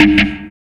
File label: Progress Beep